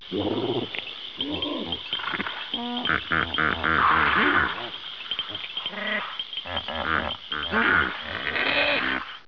Hippopotamus amphibius

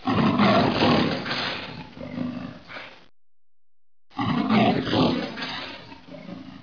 Leopard

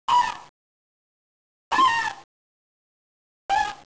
Fox

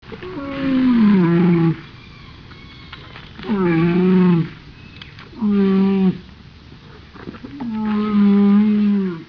camel

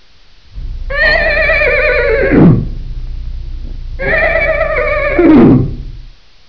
ass, animal

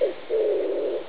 pigeon

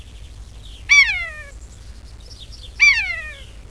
buzzard

